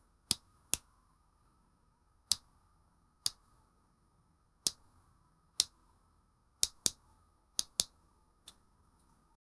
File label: comb combs long hair, grooming